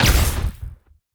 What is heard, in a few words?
Weapon Shoot